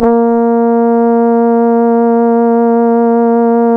A#, Synclav FM, BRASS; Big Fish Audio

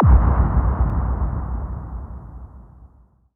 FX HIT, Techno Trance; Ueberschall

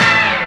DR. LOOP; X-Static Goldmine 3; CHORDS

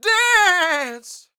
DANCE; R&B Dance; Spectrasonics Vocal Planet

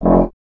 Tuba; Miroslav Vitous - Solo Instruments VOL-2

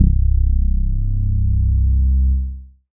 bass, c, Sub Bass; ProSamples vol.37

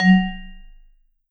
V SHRT MF F#2, Marimba & Vibes; Sampleheads; Dave Samuels

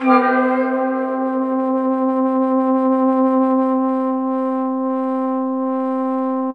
Pad; Dream Zone

